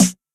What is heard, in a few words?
present snare sample b key, snare one shot tuned to the B note